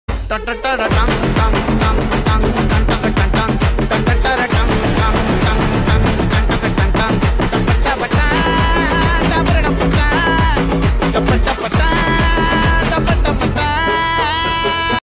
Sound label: bgm; Kalki